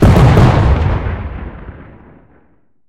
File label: artillery player